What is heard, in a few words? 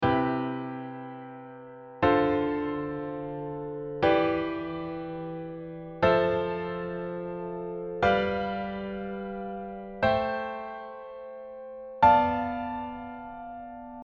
Diatonic 7th Easy Root